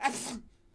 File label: Sneeze; Civ4 warlords